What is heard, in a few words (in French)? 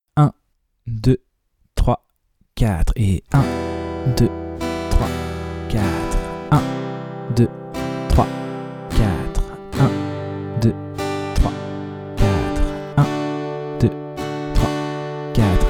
moyen travailler la nouvelle grille avec la deuxième rythmique; De 0 à vos premières suites d'accords